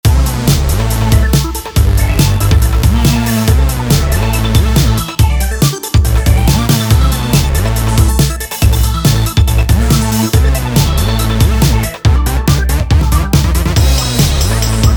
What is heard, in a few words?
Hoovers with Drums; junorator